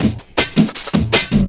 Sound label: LOOP L, Drum & Bass 160 BPM